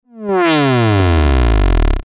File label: fail; sfxr; saw